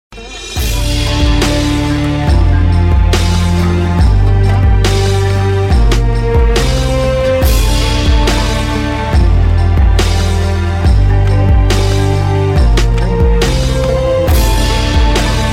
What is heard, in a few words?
Best Drums Ring